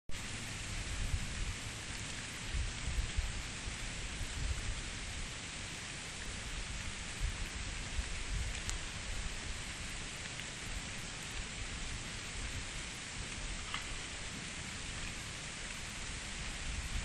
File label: snowfall, nature